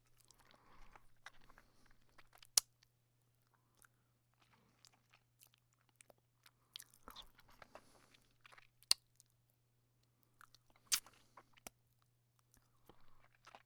thumb suck series, foley

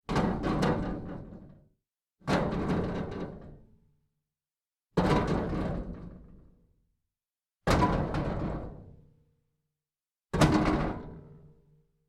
metal scrap kicking

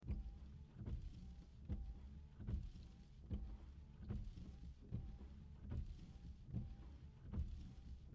slow windshield wipers

